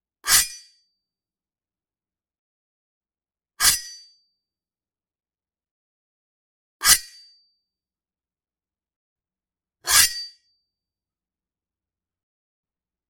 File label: Metallic Swords Shings Sounds